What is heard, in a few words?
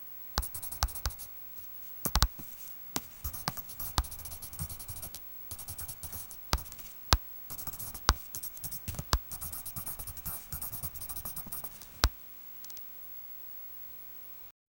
playing ipad writing lett; devices